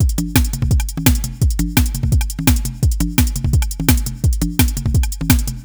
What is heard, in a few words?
DNB